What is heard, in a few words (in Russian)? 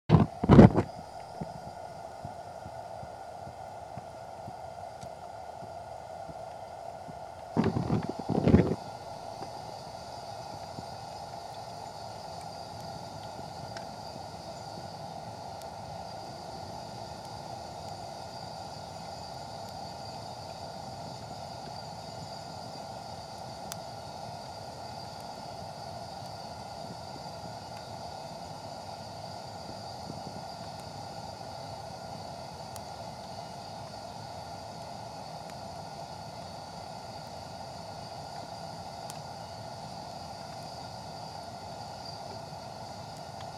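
газовая горелка барбекю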